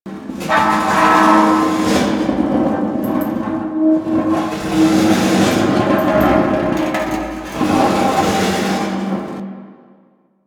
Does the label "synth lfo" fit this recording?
No